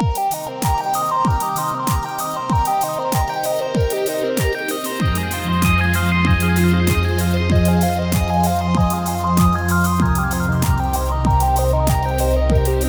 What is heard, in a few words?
Digital Downtown, fantasy